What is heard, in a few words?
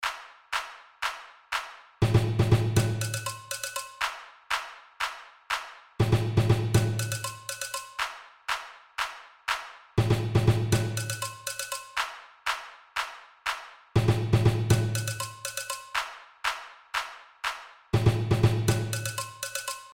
break